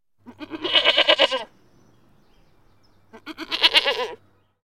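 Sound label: Goat Baas Close Perspective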